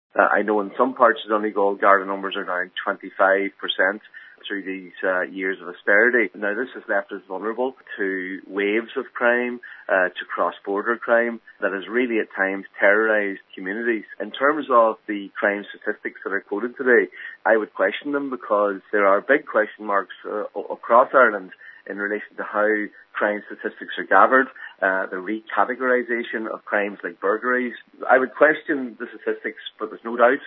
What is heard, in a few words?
pad